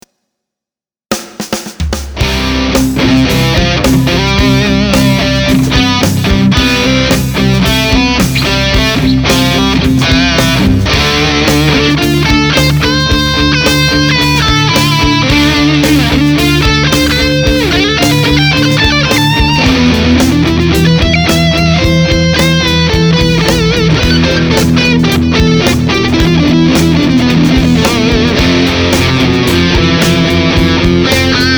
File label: C Scale over chords in Key of C